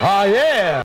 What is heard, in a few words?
DJ Scratch Sample